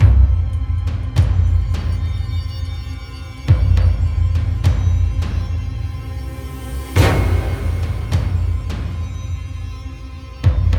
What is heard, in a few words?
BOSS IDLE loop; Forced Showdown